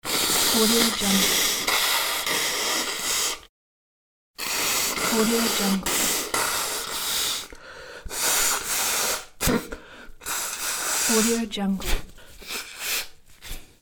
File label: blowing nose